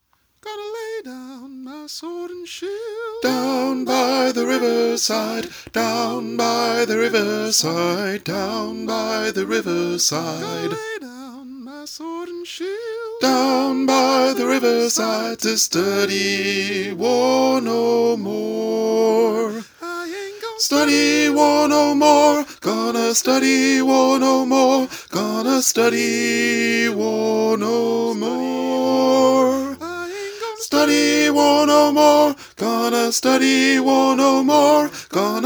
Tenor, gospel choir; Down By The Riverside

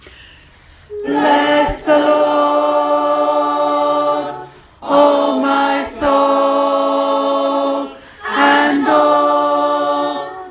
Tenors, gospel choir

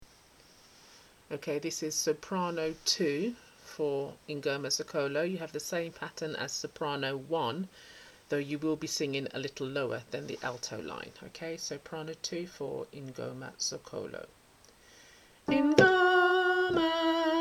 Sop, gospel choir; Ingoma

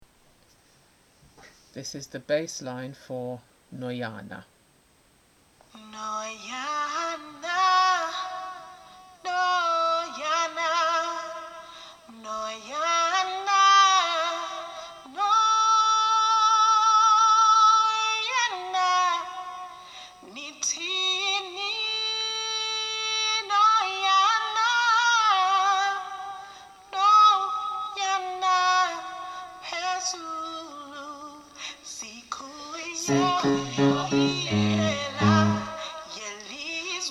Bass, gospel choir; Noyana

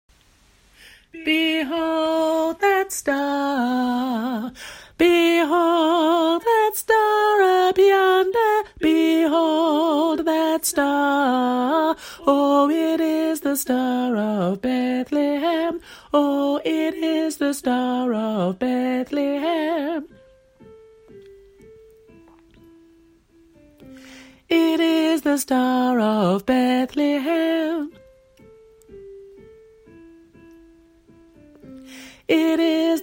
altos; Behold That Star

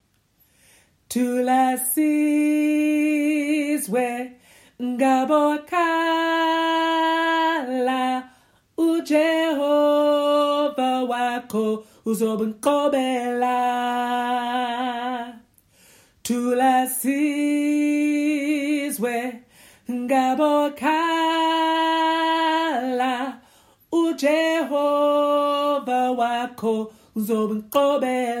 Thula Sizwe Tenor

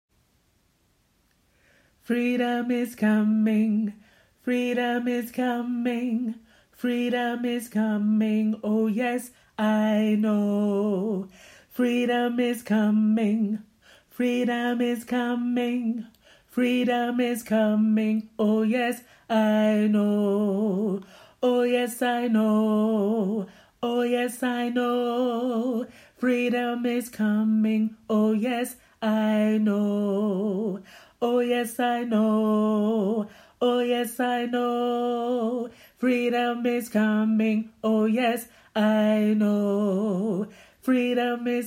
TENOR, gospel choir

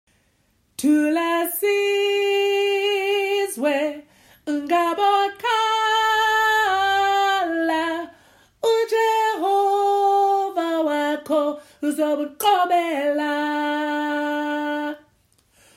Alto, gospel choir; Thula Sizwe

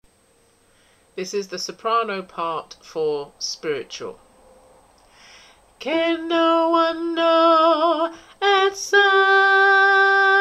Sops, gospel choir; Spiritual

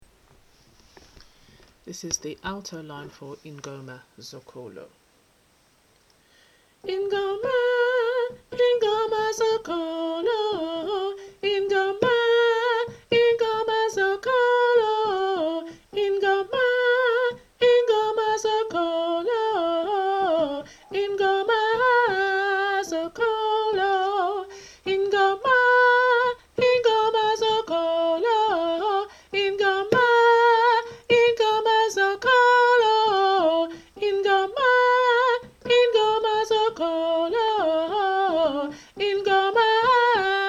Alto, gospel choir; Ingoma

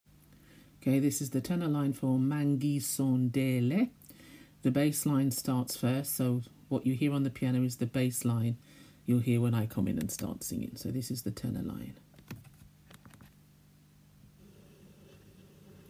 MANGISONDELE TENORS

